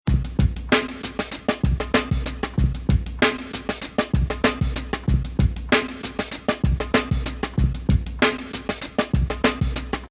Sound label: loop